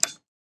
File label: switch